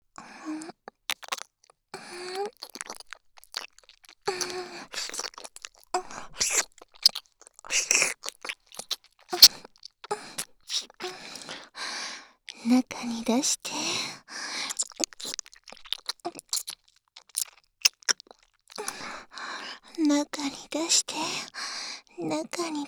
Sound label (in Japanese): 耳舐め; ハーレムオナサポ 4人のメイドがご主人様のオナニーをお手伝いするバイノーラル～文、千曜、名月、莉桜～